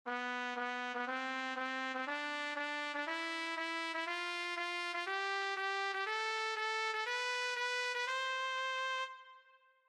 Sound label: Piano